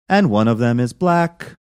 singing and one of them is black

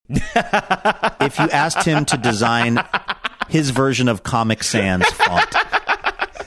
having a good laugh

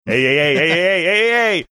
ayayayayayayayayay

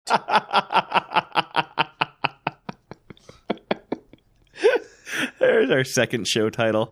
laughing saying there's our second show title

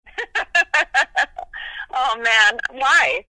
laughing saying oh man why

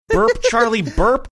burp

